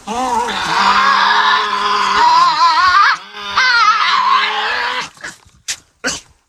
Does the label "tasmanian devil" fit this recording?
Yes